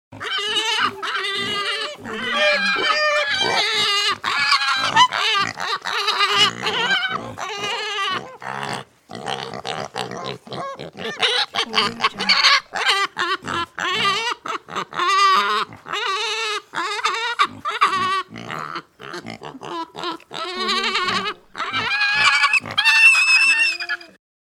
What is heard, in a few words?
pig grunting